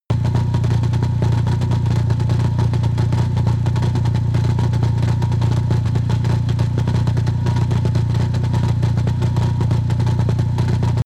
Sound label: engine damaged idle